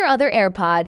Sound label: hahahahahhahaaa